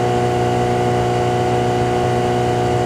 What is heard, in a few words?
Ferrari 355 Loop, Automobiles